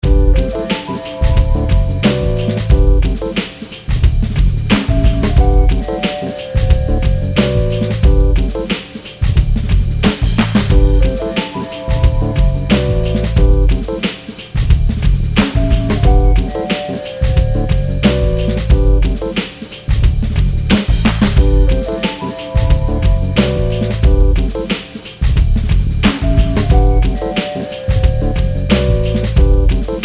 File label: mellow vib